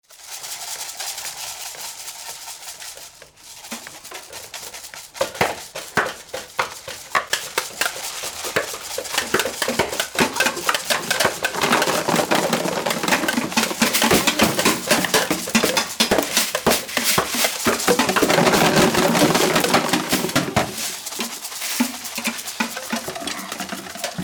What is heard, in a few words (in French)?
ensemble